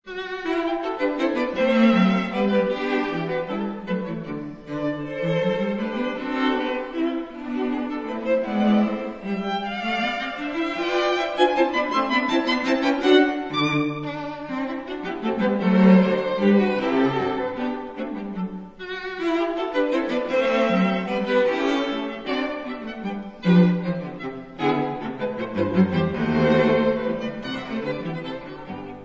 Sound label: string quartet; g jenner